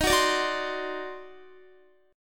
D sharp Minor Major 13th D sharp Guitar; strummed

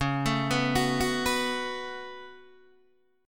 Db 7th Suspended 4th Sharp 5th Db Guitar DADGAD

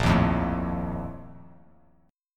Bb Major 7th Suspended 4th, Bass Standard; strummed; JGuitar

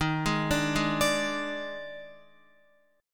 Eb Major 7th Suspended 4th, Guitar; arpeggiated; EbM7sus4

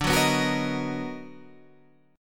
B Major 7th D; Bmaj7/D strummed; JGuitar

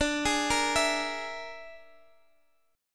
A sharp 7th Flat 5th D

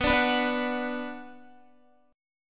B Minor, Guitar; Bm strummed; JGuitar

